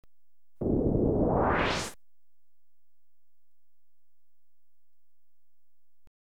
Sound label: Sliding Door (Medium, No Hum), Electronically Generated Sounds; BBC Sound Effects Library